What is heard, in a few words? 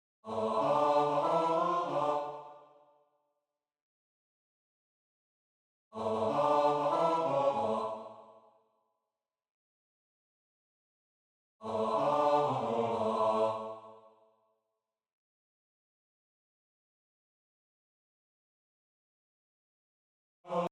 libiamo bass